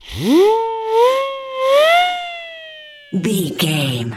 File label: comedy siren whistle fun sounds